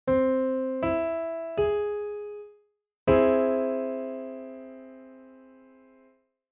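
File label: chord piano aug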